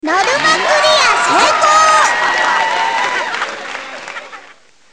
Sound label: applause